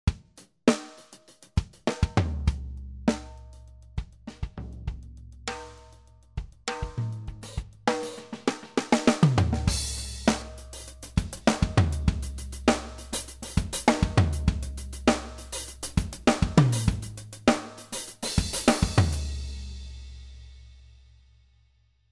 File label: Overhead blend